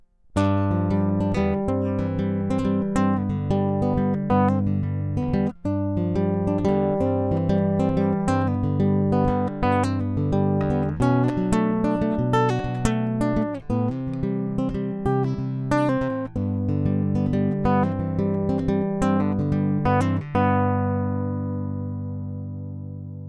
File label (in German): Konzertgitarre Fingerpicking; Ibanez FRH10N